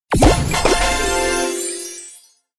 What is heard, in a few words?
level up